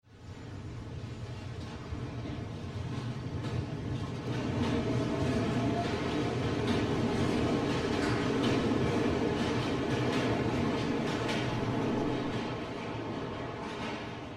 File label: Train Sound Forward